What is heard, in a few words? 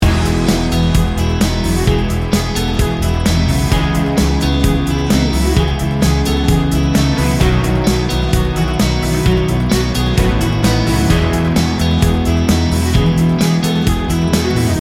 bold ambition loop